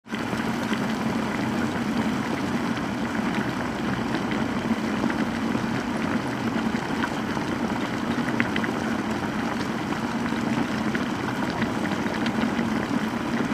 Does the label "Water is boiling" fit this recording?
Yes